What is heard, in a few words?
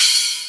single cymbal hit c sharp key; Cymbal drum one shot samples